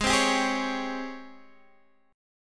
G sharp Major 11th, Guitar, Drop D; x,11,10,12,9,9

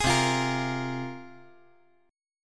C 7th Suspended 4th Sharp 5th, C, down

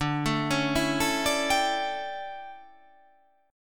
D Major 7th Suspended 2nd Suspended 4th D

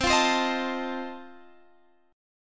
F 7th C

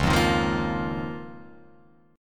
Db 6th Add 9th Db down Guitar Open F; Db6add9 strummed; JGuitar

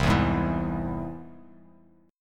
C Augmented down

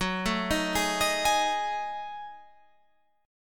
Gb Minor 6th Add 9th Gb down Guitar Open F; Gbm6add9; arpeggiated

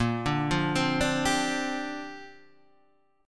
A sharp 6th Add 9th, Guitar Half Step Down; A#6add9

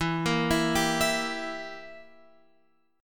E Diminished E down Guitar Open F; Edim arpeggiated; JGuitar

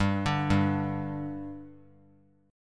G 5th G, chord; G5 arpeggiated

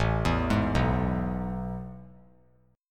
A Major 7th Suspended 2nd, chord; AM7sus2 arpeggiated; 9,11,11,9; JGuitar

